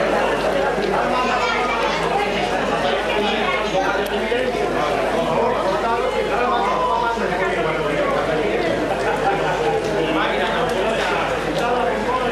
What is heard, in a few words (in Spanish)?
molinos